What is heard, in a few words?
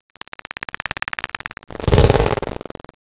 predator spot